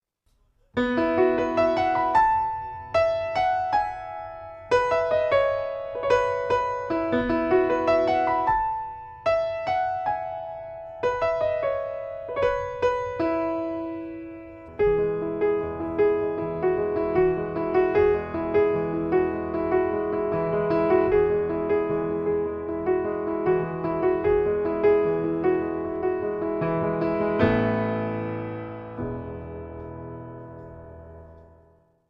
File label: piano